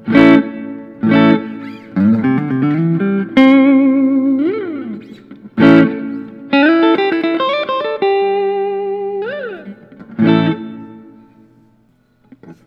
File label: collings i 35 tobacco sunburst